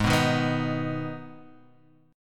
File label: G sharp Minor, Guitar, Half Step Down